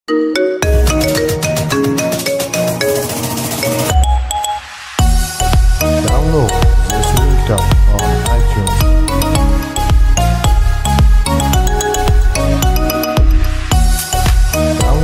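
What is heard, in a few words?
background music korean ringtone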